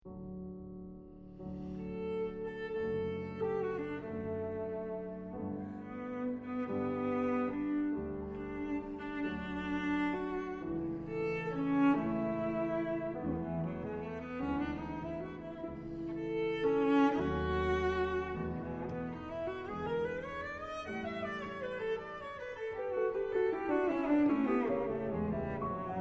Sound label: Sonata for Cello and Piano in D Major Vivace ma non troppo; Brahms